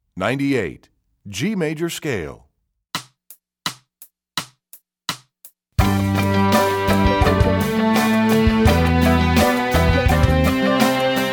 G Major Scale, Strings; EE2000 Book1

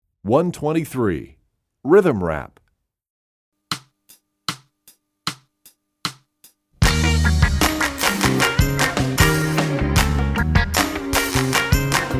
Rhythm Rap, Percussion; EE2000 Book2

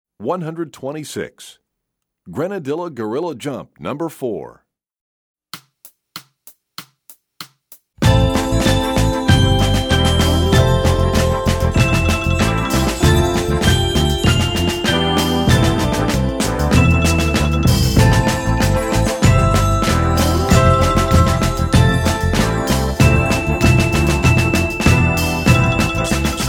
Percussion; EE2000 Book1

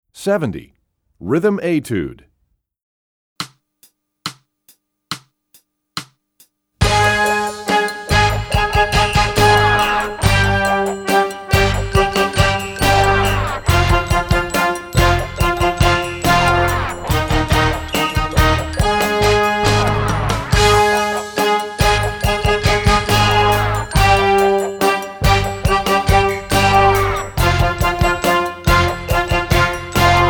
Rhythm Etude; EE2000 Book2

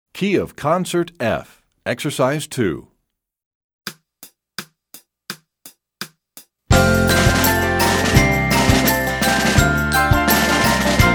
Concert F, Percussion; EE2000 Book1

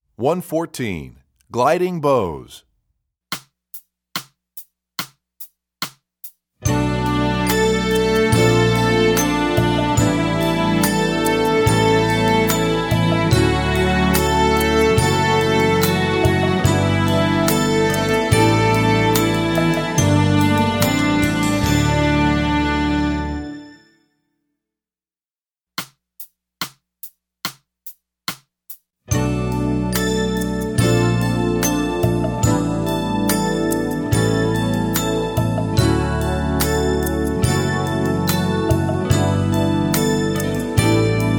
Gliding Bows, Strings; EE2000 Book1

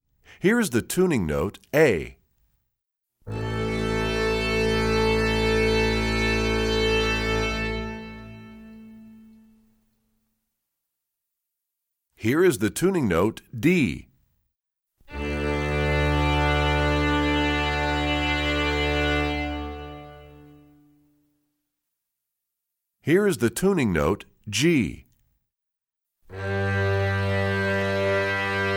Tuning Notes, Strings; EE2000 Book1

